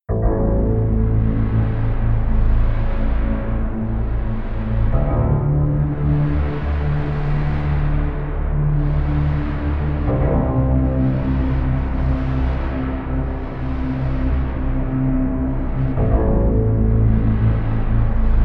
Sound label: bass